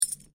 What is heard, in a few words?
COIN PENNY SINGLE DROP HAND; Common coins; Money; Objects